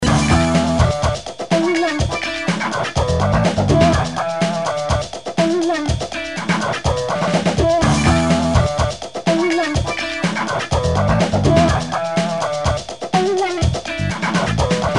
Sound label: Funky Groove; Jazz Jackrabbit 2